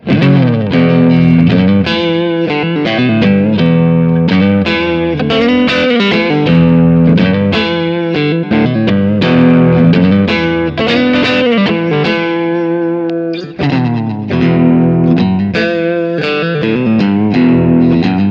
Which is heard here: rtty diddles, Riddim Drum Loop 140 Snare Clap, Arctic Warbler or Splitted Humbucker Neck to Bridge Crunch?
Splitted Humbucker Neck to Bridge Crunch